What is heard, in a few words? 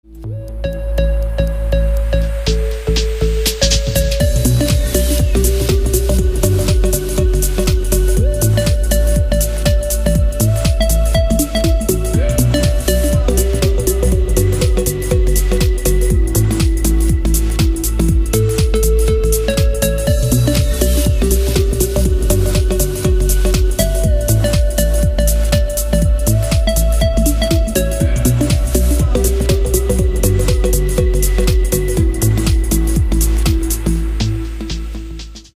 keyboard melody